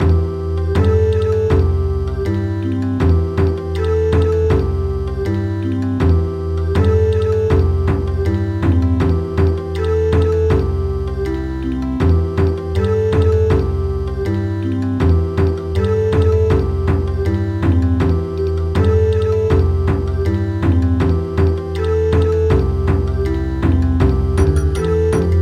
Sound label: Bon Odori, midi